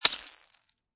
Glass Debris Smash, Impact, Glass Debris Smash, Crushing Broken Glass